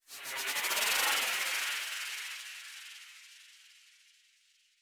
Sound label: Heavenly